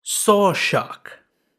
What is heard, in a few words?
saw shark